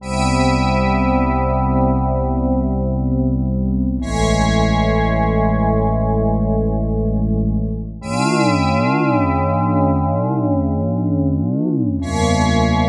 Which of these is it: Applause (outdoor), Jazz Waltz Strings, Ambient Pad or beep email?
Ambient Pad